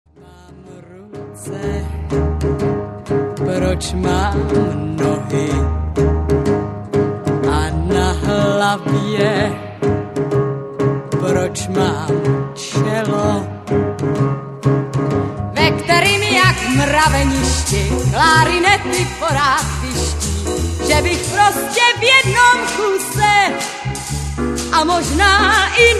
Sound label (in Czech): musim zpivat jazz